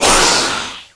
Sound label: attack screech, headcrab black; creatures; vox